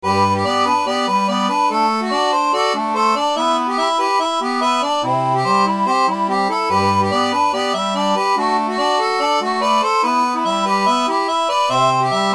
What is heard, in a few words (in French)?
orgue barbarie; seraphone